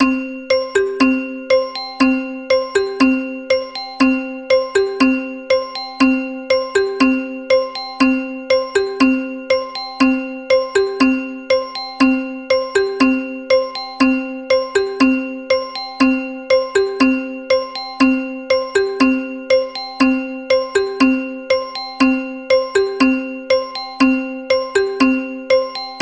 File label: Puzzle Game Wait